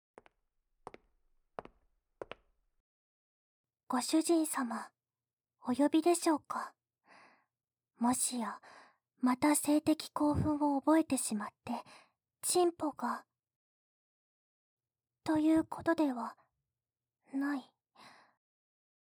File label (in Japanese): ダークエルフ の メイド さん による 耳舐め; 効果音あり